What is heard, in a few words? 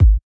Underwater Kick Sample